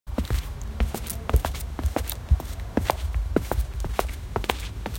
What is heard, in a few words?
footsteps running